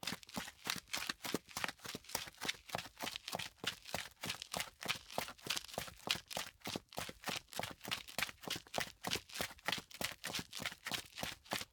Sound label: Footsteps Loafers; LOOPERS